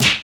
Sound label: firepunch hit